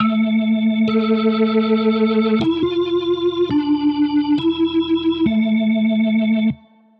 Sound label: synth loop, drum n bass